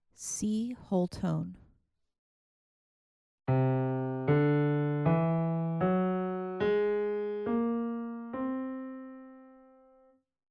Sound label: whole tone scales